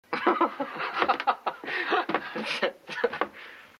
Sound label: laughter